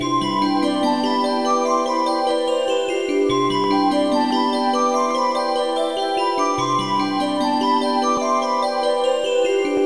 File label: levelup